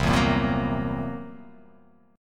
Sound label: C 7th Flat 5th C down, chord